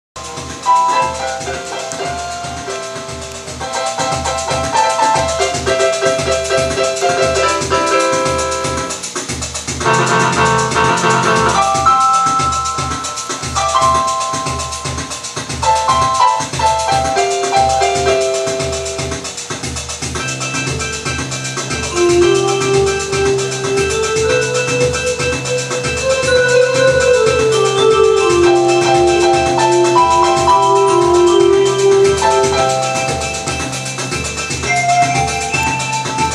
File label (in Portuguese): samba